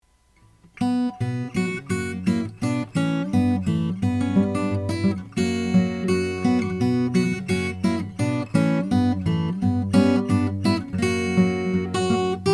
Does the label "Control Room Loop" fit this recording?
No